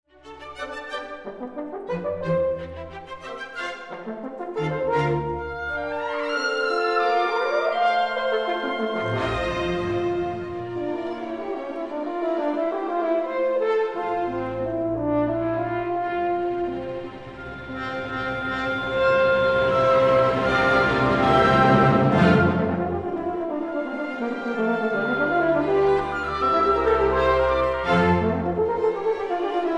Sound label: morceau de concert pour cor et orchestre op94; Saint-Saëns 1887